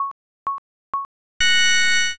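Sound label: countdown new year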